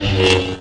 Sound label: lt saber swing; Kings